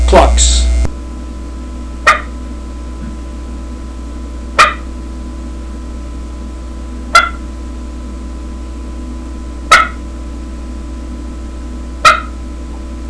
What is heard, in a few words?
clucks, turkey hunting